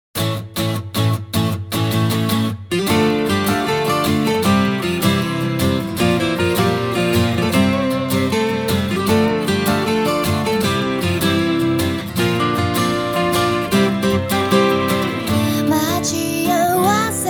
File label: acoustic version